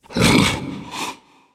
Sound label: tank growl; voice, idle